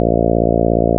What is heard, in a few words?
synthesised overtones